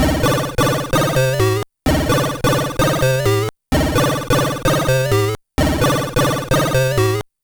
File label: Wonkin' Bits Synth 129 BpM, Nintendo Gameboy-LSDJ; maurice.rebell Loops